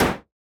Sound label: meta hit normal; CrossCode; battle